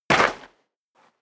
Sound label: step sneaker gravel light jump